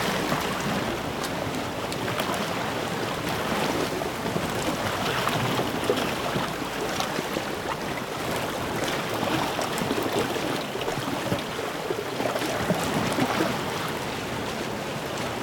creature glowwhale surface swim

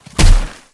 creature run; snowstalker

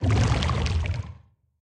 creature brute shark swim slow

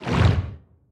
creature brute shark swim fast

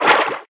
creature swim fast; featherfish

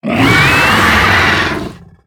creature chelicerate roar aggro

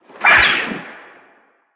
creature steal; seamonkey

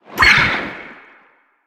creature steal; seamonkey

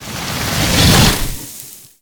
creature snowstalker standup start

